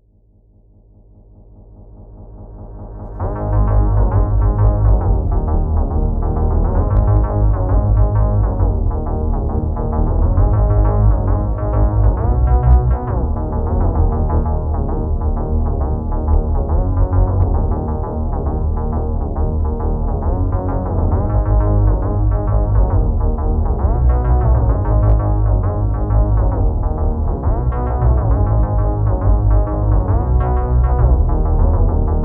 processed bass

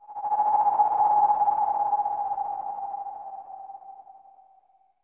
fogs

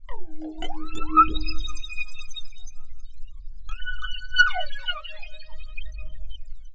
plinkity whoo

